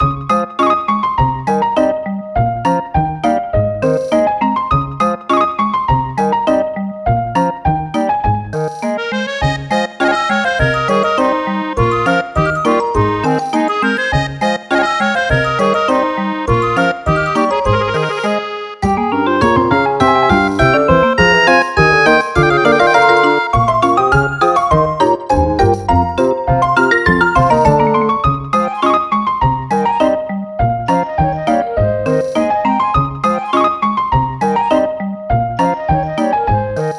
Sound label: Laid Back Retro loop